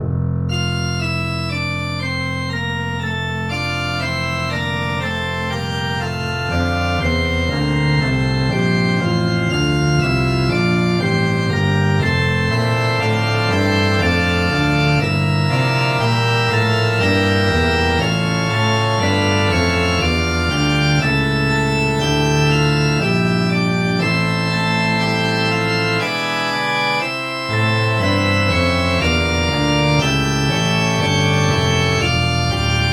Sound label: organ